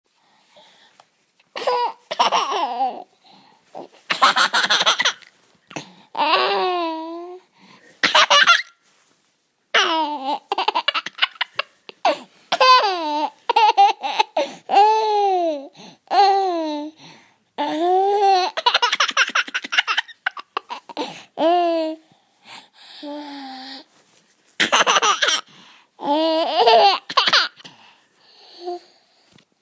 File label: Laughs